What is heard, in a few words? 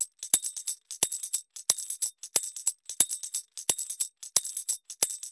ETHNO EXTRA 90; ethno2